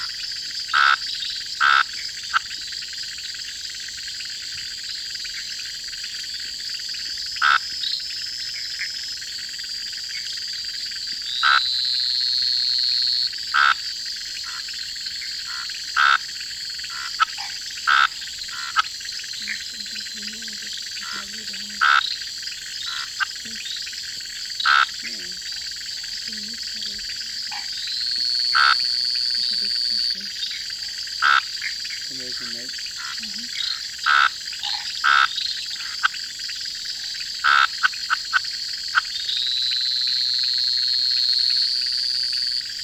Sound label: Scinax ruber; CENBAM